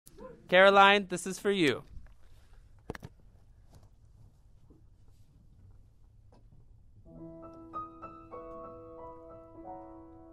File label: Twinkle Twinkle Little Star TTBB Chorus and Piano